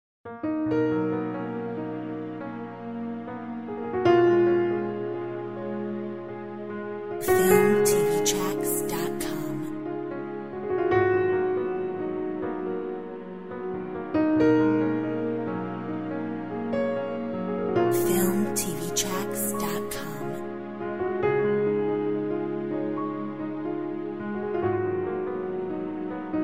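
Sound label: gentle rain Orchestra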